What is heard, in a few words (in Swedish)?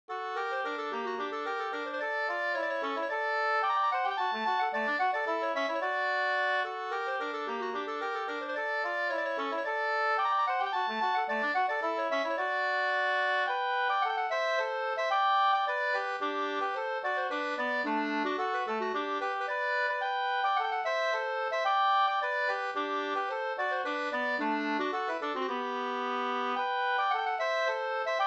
polska Bingsjopolska; e Pekkos Olle e Pekkos Helmer nr2 2st